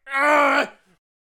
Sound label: Bank Robbers Attack Vocals